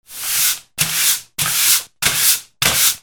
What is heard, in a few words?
GARAGE